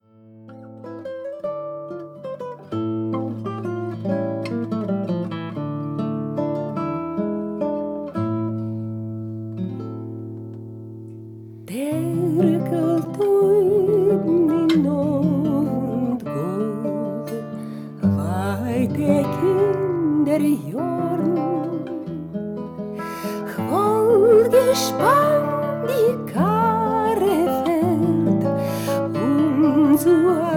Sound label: the tailor apprentice is singing